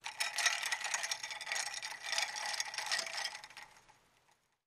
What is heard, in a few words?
Ice, Stir Ice Water.